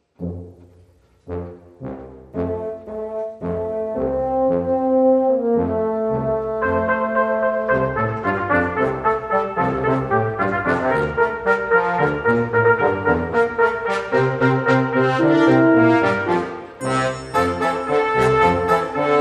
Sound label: Brassy Jingle Bells